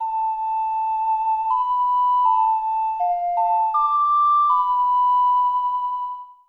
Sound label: Ocarina; Nintendo - The Legend of Zelda Majora's Mask; Video Game OSTs